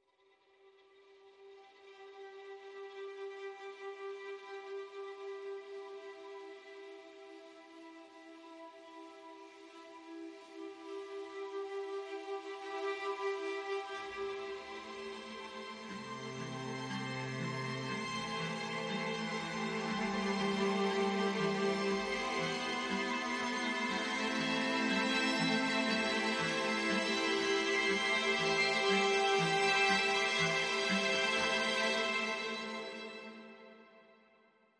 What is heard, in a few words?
Strings Only Mix